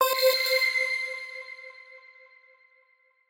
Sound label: Cyber